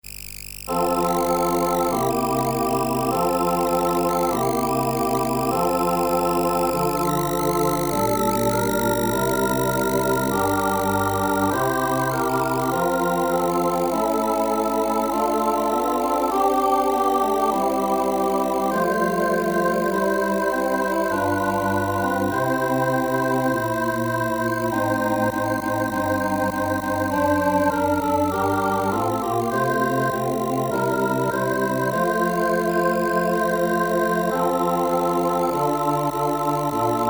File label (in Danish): orgel